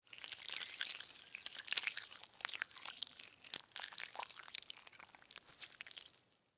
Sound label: Slug Crawl Move, Slimy, Wet; Animal; Worms; BlastWave